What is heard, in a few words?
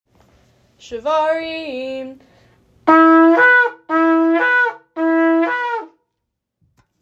shevarim